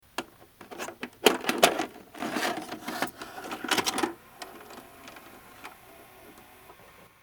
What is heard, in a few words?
insert VCR